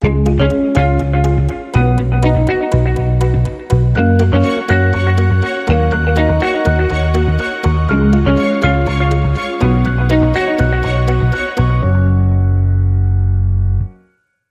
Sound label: Smarter Ways Happy Days, No Drums